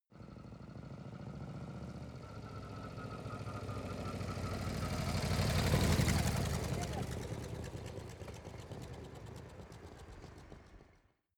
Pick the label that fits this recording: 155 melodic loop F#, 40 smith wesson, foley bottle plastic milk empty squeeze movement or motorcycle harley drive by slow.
motorcycle harley drive by slow